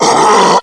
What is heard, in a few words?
dead zombie